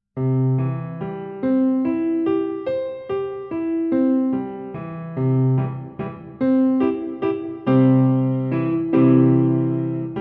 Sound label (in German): C-Dur Dreiklang Übung